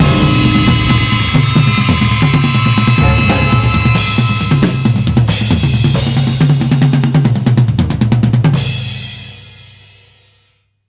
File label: Orchestral Madness Drum Solo; Club Penguin original soundtrack 2005-2017